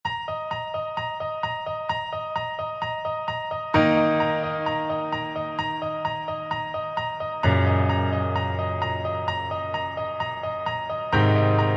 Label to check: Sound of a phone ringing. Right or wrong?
Wrong